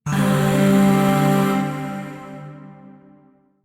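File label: Shawzin Pentatonic Major Chord; Warframe; Zariman; Void Shawzin; Chords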